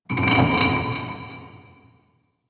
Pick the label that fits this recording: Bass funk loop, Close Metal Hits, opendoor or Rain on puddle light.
Close Metal Hits